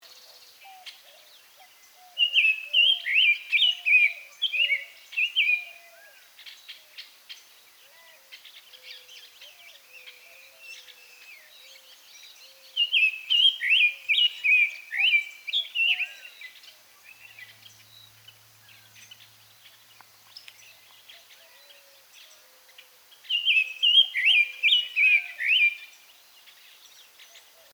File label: Summer Tanager Piranga rubra